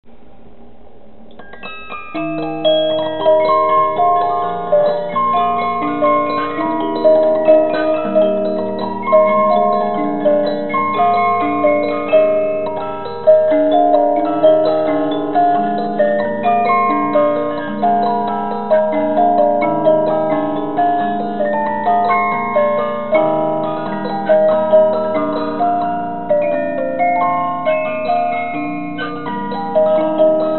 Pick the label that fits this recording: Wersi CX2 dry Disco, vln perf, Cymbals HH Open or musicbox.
musicbox